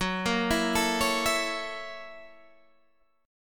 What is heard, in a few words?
Gb 7th Sharp 9th Gb Guitar DADGAD